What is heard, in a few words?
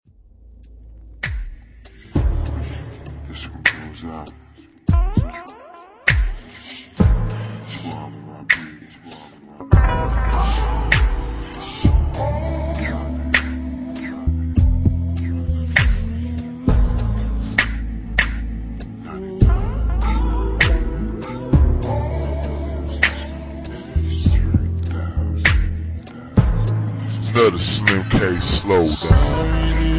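chopped and screwed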